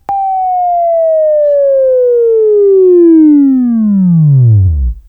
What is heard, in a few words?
WAV Right, Sweeping; Sweeping Training program